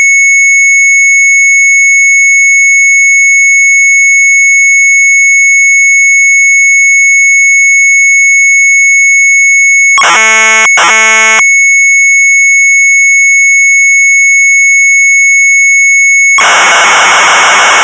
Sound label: MZ800 Tape, sharp; BIORITMIEK; retromelsarchive